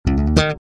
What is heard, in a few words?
bass; camp rock back to school